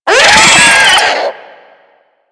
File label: Infested Biped Death; Warframe; Moa